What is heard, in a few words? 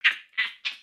Pets Skunk Idle Bored; creatures pets; Lego